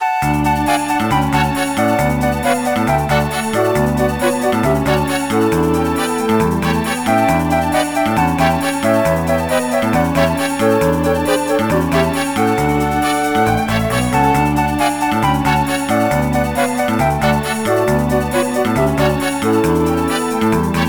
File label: The music for the menus; ISDS